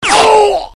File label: death; hl2dm